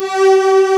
STRG C4; East West; Global Sounds

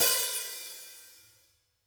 VINT KITS; Sonic Reality - Vintage Timetraveler

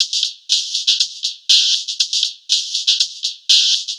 Cymbal; Sonic Foundry (Sony Creative Software); Trance NRG VOL-2; Drums; Cymbals

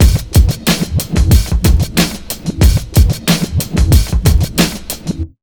92 BEAT, Pure Electro, BEAT MIX

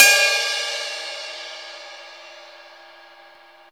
RIDE BELL; USB Soundscan vol.27; Ragga & Dub Reggae; AKAI; CYMBALS K

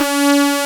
SYN, analog

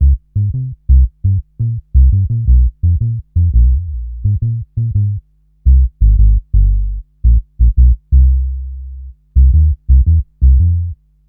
Upright Bass, Drum & Bass; Fluid Dynamics

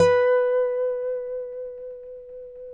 Finger B 4 L, Guitars; Spectrasonics; Hans Zimmer Guitars VOL-1

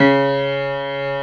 F2, ORCHESTRA; Roland - LA Composer vol. 1

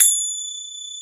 Finger Cym Open; Digital Sound Factory Virtuoso

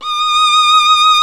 SOLO STRINGS

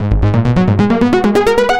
NRG Arp, trance

